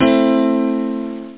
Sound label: piano, pianos